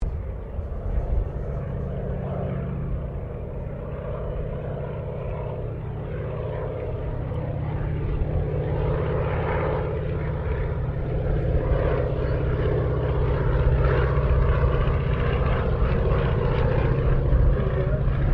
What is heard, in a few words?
AIRCRAFT SOPWITH PUP REPLICA PASS; Replica of a 1916 Sopwith Pup; Airplanes (Sound Effects:Vehicles)